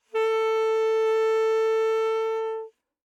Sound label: Alto Sax No Vib ff A4